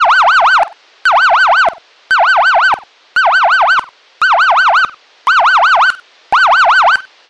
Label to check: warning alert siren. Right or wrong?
Right